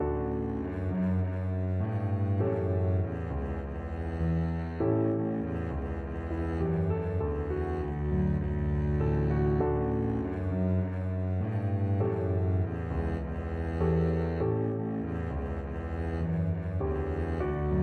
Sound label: Hush Little Baby, Double Bass